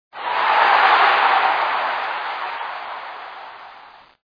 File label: Voices Crowd Cheer; Portal Fighter II